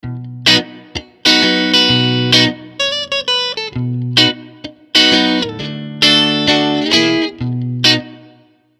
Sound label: Fame Classic III Middle Pickup